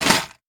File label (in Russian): Одел броню